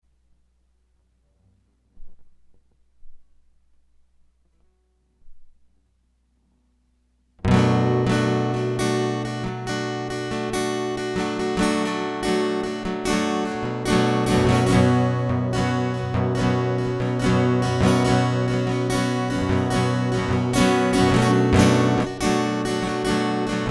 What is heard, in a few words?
Guitar Loop